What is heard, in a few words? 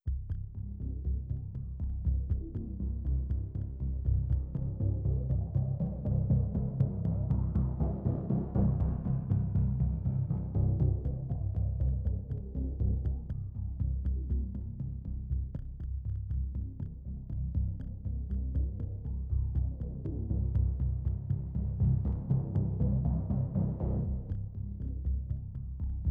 Basses 120